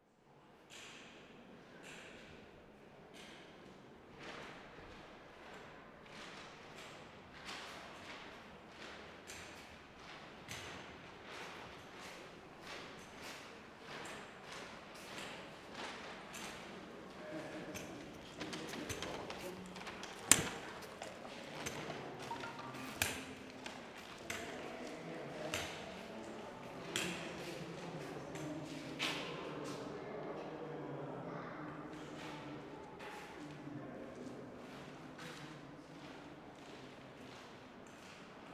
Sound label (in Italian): strada soterranea; Un modo di esplorare un territorio fondato sull'ascolto